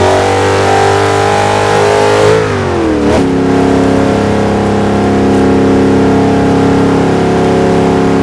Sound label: fourth cruise, vehicles; gmod; tdmcars; zenvo